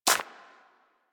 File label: Bird Of Prey Step Dirt; Warframe; Footsteps